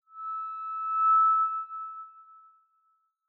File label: Megaphone Feedback Reverb